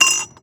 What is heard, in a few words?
Moody Park Chimes Perc; Houston; percussion